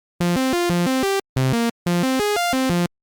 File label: arpeggio